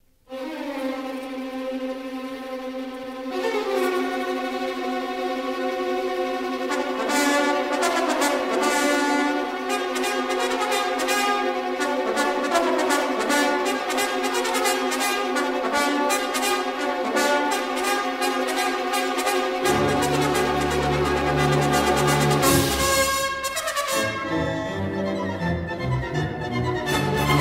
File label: Tune; Vlaanderen; Scheherazade; paul temple in groot brittannie; Francis Durbridge